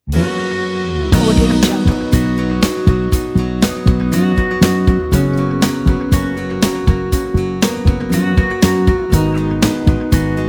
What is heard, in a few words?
acoustic romantic slide